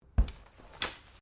Umbrella Close; Umbrellas (Sound Effects:Objects)

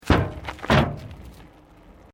Bicycle Mountain Bike Road Peddelling Metal Grate

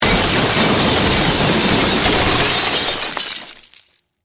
Crash, Wood, Glass; Wood Sound Effects; Materials

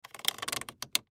Plastic Knob Turn Clicks Dial; Foley

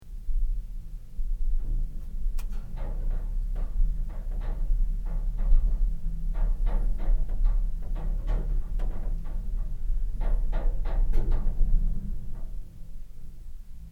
LIFT FACTORY OLD OPERATING; Various lifts operating; Elevator Sound Effects; Elevators (Sound Effects:Machines)

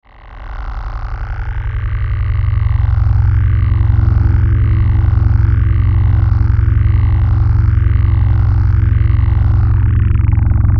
Electrical Low Hum; Electrical Low Buzz Hum Warble

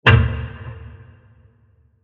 Wood Impact With Long Reverb; Blasts; Impacts; CraftedSignals

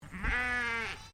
Animal Sheep Dorper Baa; Sheep on a farm in Bright Victoria; SoundEffects-Foundation1

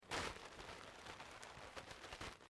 PLASTIC SHEET SMOOTHED ROLLED

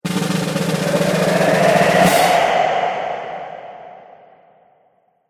Radio Stinger

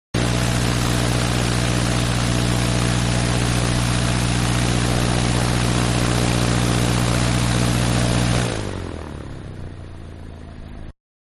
Airplane Single Engine-Accelerate And Engine Down; Manhattan Production Music

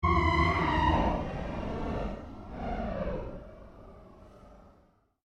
Created Cyber Whale Call, Alien Sound Effects

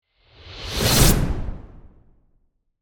Magic Spell Whoosh, Horror, Magic, Spell, Whoosh; Impact; Magic Sound Effects

